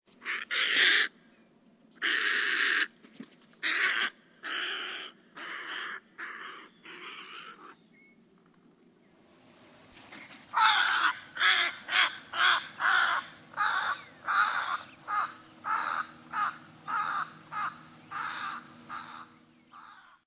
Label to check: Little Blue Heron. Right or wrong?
Right